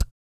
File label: Vocal, Blues & Soul; ILIO; Vocal Planet VOL-2